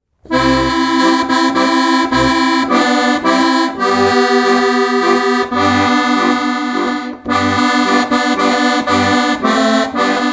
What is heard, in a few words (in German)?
Süßer die Glocken nie klingen, akkordeon weihnachtslieder